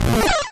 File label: squash; Dino Run